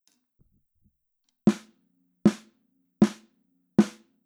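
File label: SM57 snare, drums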